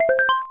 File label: message; Los Acantilados Rojos; smbx38a; The Great SMBX 38A Contest II